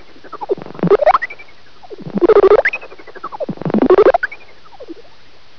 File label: lesser horseshoe, bats